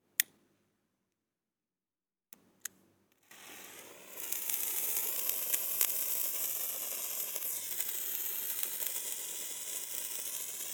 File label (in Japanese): 手持ち花火（噴射系）; 夏実と縁側花火デート